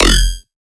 Riddim Bass Hit F

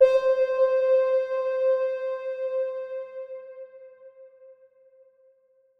LEAD (C); Melodic One Shots; Crazy; Cymatics; GHOST (Beta Pack)

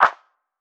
Clap, Drum One Shots; Claps; Cymatics; Eternity Sample Pack

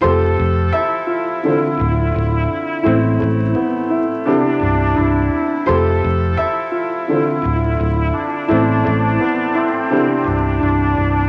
85 BPM A Min, Hip Hop Melodies Lofi; Broken Record

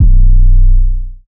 808 B; Cymatics; Oracle Sample Pack; 808s

